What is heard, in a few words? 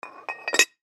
Tire Wedge Metal, Tire Wedge Metal Put Under Tire Chock; Transportation Aircraft